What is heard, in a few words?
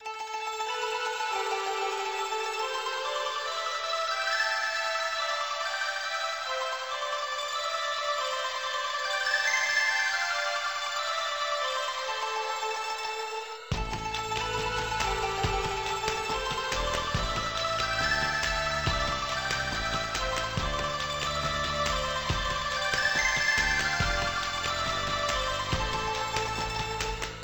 music; Imperial Sudoku